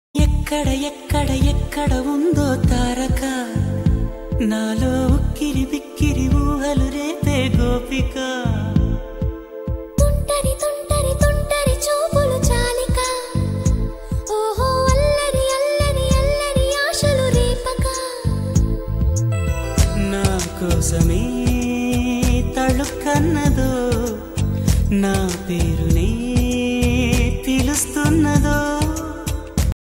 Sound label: tamil ringtone